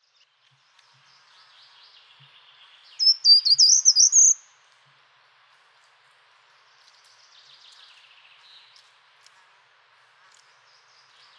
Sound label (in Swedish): tradgardstradkrypare, fagelljud